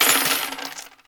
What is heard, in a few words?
coins; garrysmod